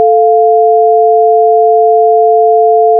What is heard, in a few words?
minor sixth